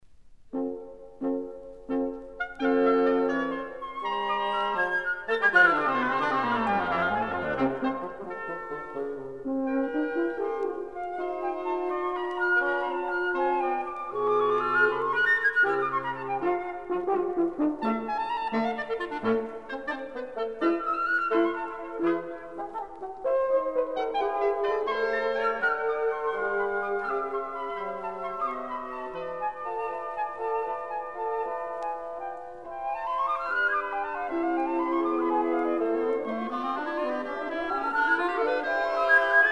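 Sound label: wind quintet; onslow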